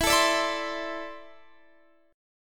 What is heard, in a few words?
E 7th Suspended 4th Sharp 5th, Guitar, Open D